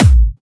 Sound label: Clubby Kicks, Bassdrums